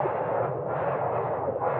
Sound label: frost loop; MagicArsenal